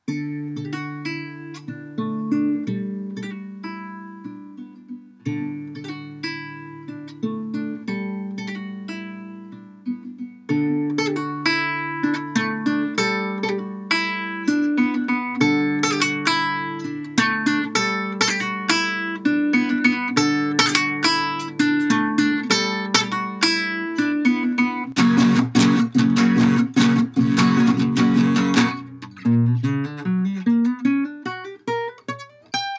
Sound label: RINGTONE